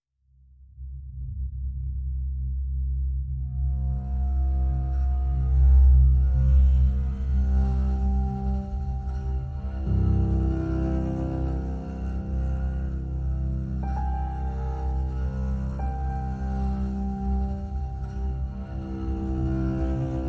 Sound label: Texture